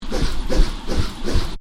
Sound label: Swish twirl, Swish: Twirl Loop; Whoosh Sound Effects; Whooshes (Sound Effects:Sound Design); Stuart Duffield